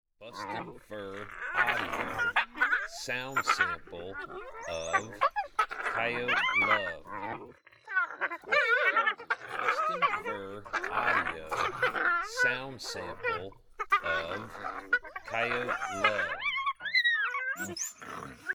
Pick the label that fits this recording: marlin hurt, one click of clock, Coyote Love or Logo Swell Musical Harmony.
Coyote Love